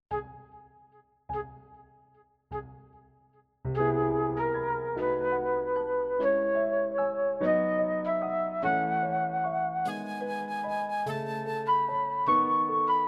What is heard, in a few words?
medium stereo; Uebungs-CDs; Playbacks